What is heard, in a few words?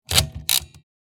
Casino slot machine reel start